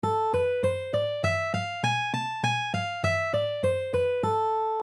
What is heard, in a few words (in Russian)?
Garmonicheskij